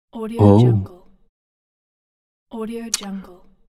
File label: disappointment; teasound